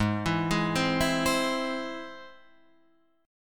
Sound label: G sharp Augmented